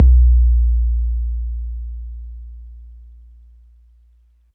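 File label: bass drum 808 MPC60 Click A#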